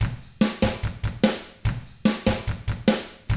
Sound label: rock drums